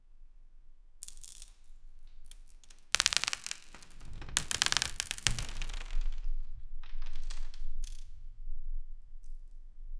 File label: wet latex squeaks, plastic